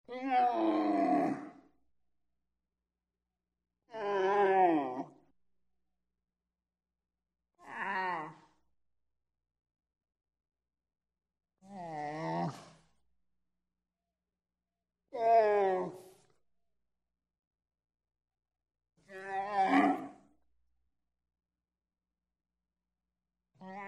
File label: CAMEL VOCALIZATIONS; wildlife sounds